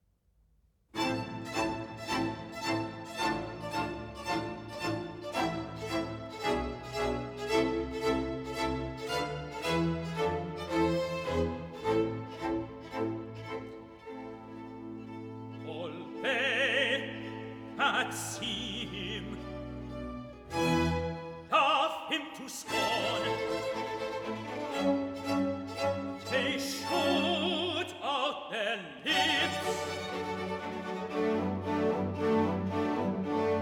Accompagnato (Tenor) "All they that see Him laugh Him; Messiah